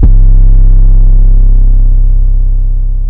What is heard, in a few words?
Plugg 808; drumkits; fl studio; dstarkels plugnb